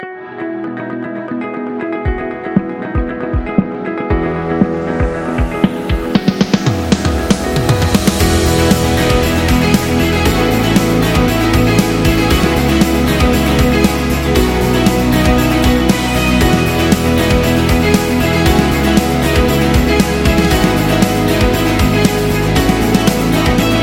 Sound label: Upbeat Explainer